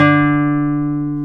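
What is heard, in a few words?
classical guitar, nylon string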